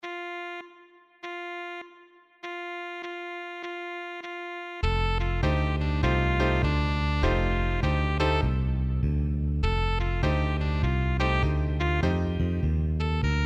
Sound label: play along drums